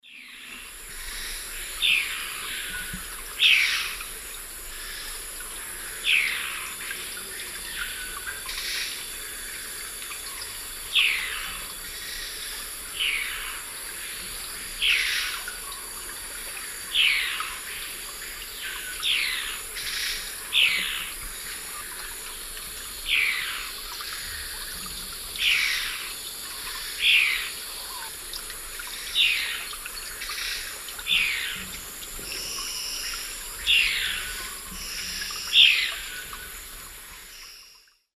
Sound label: Rhegmatorhina melanosticta, call; S. America; Eastern Lowlands